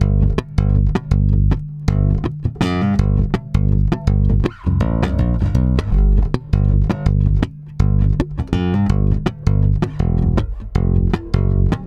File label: STRUM A, Bass; Spectrasonics; Bass Legends; 88 STRUMMIN